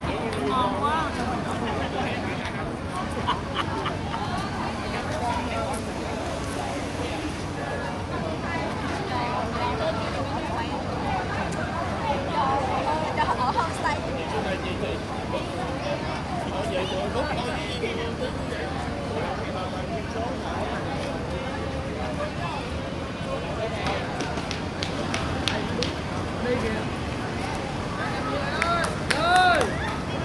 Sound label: street traffic crowd walla slow car bys running kid bus arrive depart, singapore ambiences; sonniss gdc